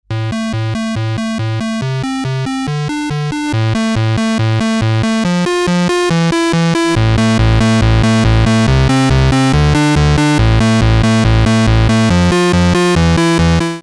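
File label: Roland MC-202 Basic Square Pulse Sub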